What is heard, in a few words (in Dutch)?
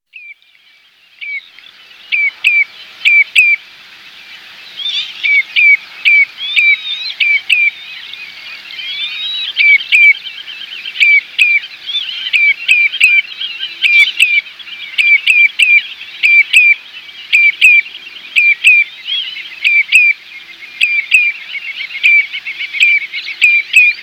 tureluur